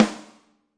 real snare; A Real Freakin Drum Kit

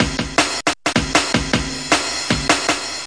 156 airi brk, drum loops and breaks; 141 to 160 bpm

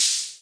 rhma hh, Whippany Rhythm Master; drum machines; 1970s

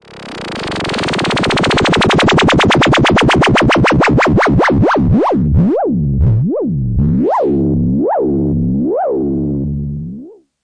descending whup whup whup; Bleeps Blips Blonks Blarts and Zaps

